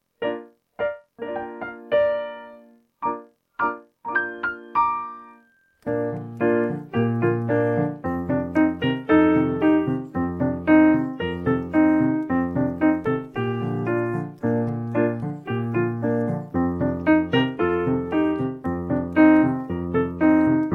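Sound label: backing track; pesenka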